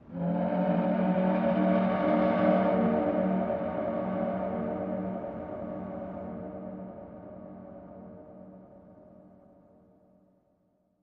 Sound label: Horn Train Fog 1 Long